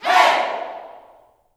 "HEY", Gospel, YELL SHOUT; ILIO; Vocal Planet

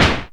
ANASNARE; Back In Time Records; Big Bang; SNARES

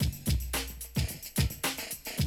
110, Classic Drum Loops

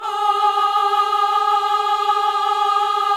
VIB F G#4 -R, Symphony of Voices; Spectrasonics

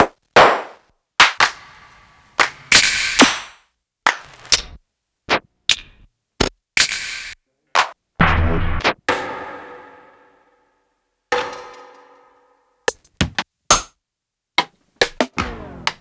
Clap Rim; Lost Tapes From Mars

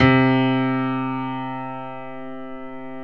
C3 PIANO

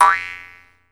Jaw Harps

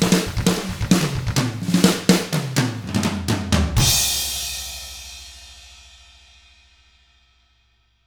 129 ROCK, Drums; ILIO; Double Platinum Drums 1

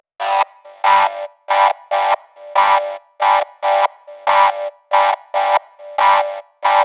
Arp 140bpm G, Trance; Arps; Zero-G Trance Inducer; 90 s Sample CDs

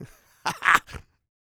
LAUGH; Spectrasonics Vocal Planet; Jazz FX

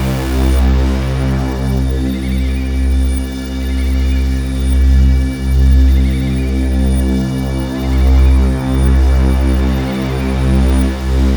CYBER DRONE, Pads; Distored Reality 1&2

